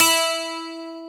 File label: 12STR, PIANO